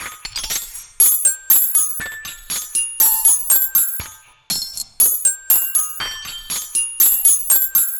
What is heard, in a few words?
Color Xylophone Loop, Ethnic Instruments; Sonic Foundry (Sony Creative Software); The Electronic Music Manuscript Collection